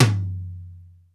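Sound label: dry tom, dry kits; Crypton; DrumBase5000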